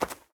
snow run, step; Minecraft; Remastered

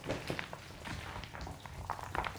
small slide, ambient underground

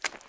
mud walk, step

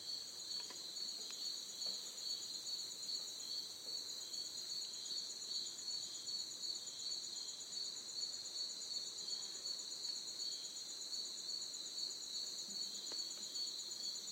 jungle, ambience; minecraft; Remastered

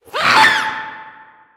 scream

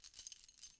chain, step

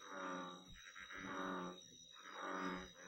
bullfrog

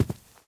grass walk

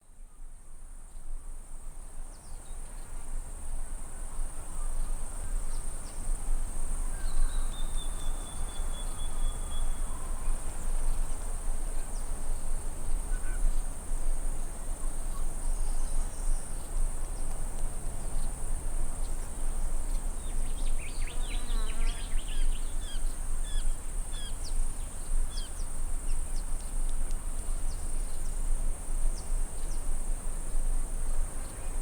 desert day, ambience; ambient; minecraft; Remastered

